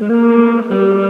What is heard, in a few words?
loop singing